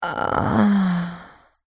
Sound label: human female groan pleasure orgasmic; zapsplat